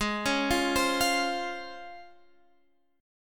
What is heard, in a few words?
Db Major 7th Ab